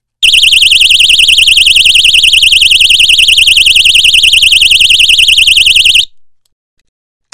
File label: buza